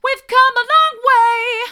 we've come along way mid, vocal

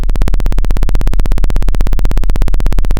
Sound of a plane taking off

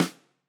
Snr, drum

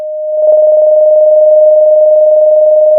pop, Sound of a phone ringing

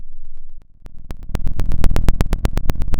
Sound of a fire crackling

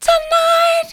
tonight soft, vocal

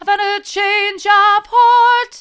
i've had a change of heart mid, vocal

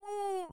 pain moderate; VIVAE; vocaltrax

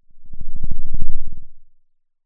pop

